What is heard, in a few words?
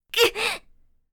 Pain grunt hit anime girl vocalization